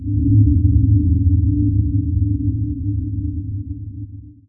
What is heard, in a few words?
drone